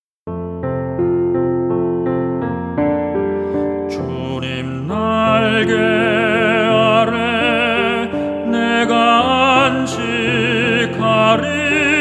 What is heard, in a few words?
TENOR